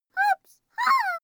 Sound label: child play jump